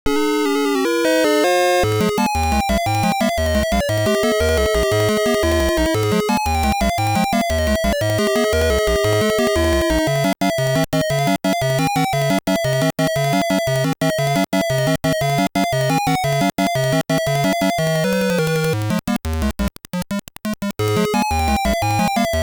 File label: ~Bataille~ (Encounter ~ Battle Food Empty ~ Victory)